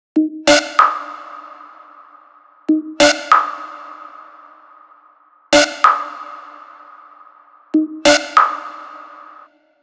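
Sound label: Synths, 95, D minor; Nerve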